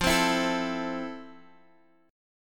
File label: F Major 7th Guitar Open F; FM7; x,x,5,7,7,4; strummed